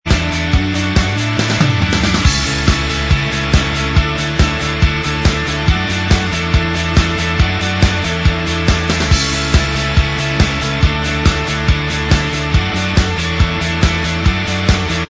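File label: instrumental, backing tracks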